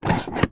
hospital ward hand sanitiser pump plastic wall mounted single pump